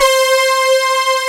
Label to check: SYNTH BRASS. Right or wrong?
Right